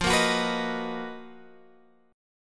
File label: B Major 7th F, chord; Bmaj7/F; strummed